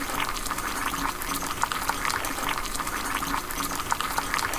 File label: taunt table flip bubbly pot water; video games; source engine; tf